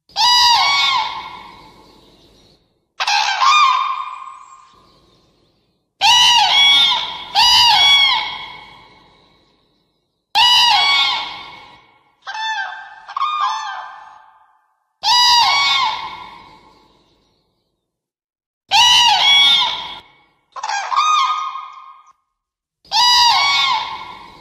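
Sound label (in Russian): zhuravlya, crane; zvuki ptits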